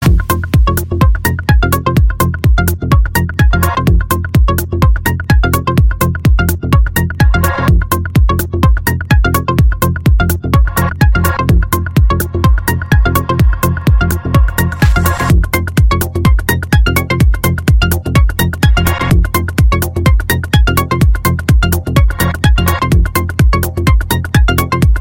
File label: calm techno